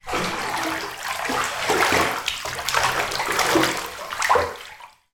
household bath water movement deep; sound effects one

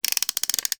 toy ratchet wind

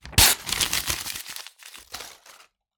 Book Paper Rip Scrunch Pile

